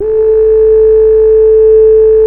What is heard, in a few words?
BEGIN LOOP, Synth and Organ; InVision Interactive; Keith Emerson; The Most Dangerous Synth and Organ